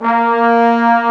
BONES, Sweet Brass; E-MU Producer Series Vol. 2 – More Studio Essentials; Composer

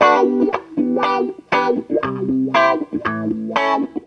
CLAV GTR; USB Soundscan vol.32; Roots Disco; AKAI

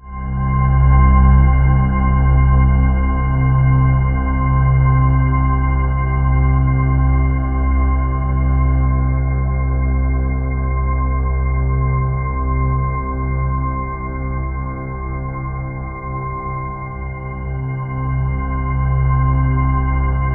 MORNING, Ominous Drones, SYNTH SND; USB Soundscan vol.48; AKAI

